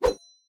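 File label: training strike with sword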